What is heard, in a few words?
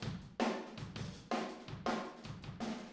Room, Drums, 162 BPM; Drums from the Big Room The Mixes; Take Your Toms Off; Verse